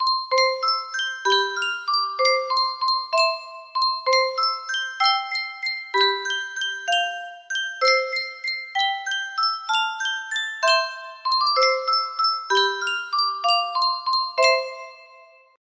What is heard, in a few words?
Sankyo Music Box, music box melody